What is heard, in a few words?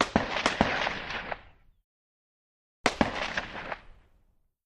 Russian Sks: Single Shot, Then Double Tap